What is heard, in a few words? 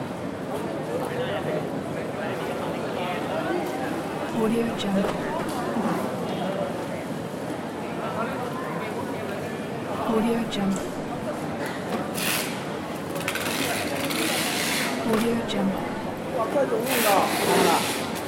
ambiance airport checkin hall loop